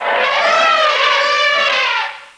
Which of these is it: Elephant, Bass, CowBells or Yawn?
Elephant